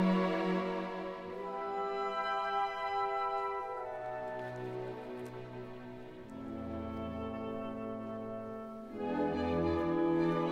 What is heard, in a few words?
flute audition excerpt; Brahms 4